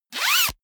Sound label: zipper pullover jacket close fast